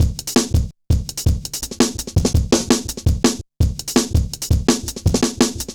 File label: Jazz Shuffle, Drum Loops; Zero-G Jungle Warfare 1, 2 & 3; 90 s Sample CDs